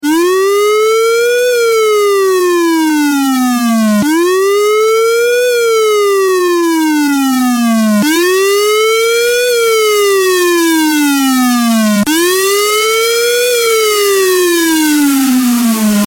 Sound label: High Volume Funny Alarm Ringtone